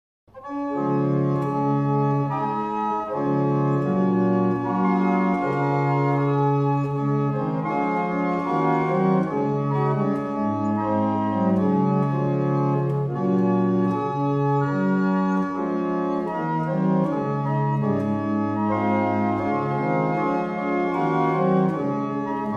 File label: barrel organs; Tytherley; Easter Hymn